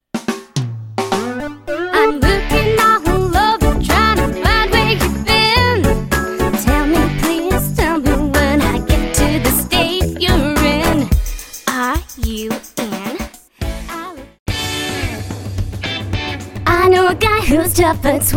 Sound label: Bilingual Singing